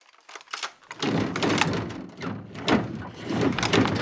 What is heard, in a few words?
satellite idle; cliff; Call of Duty Modern Warfare